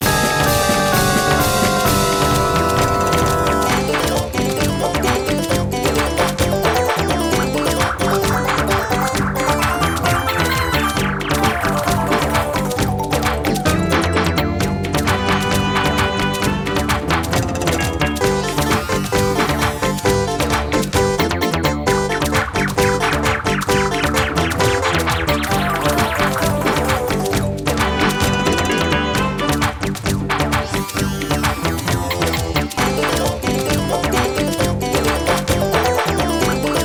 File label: Boss Battle; paper mario the thousand year door complete; GameCube version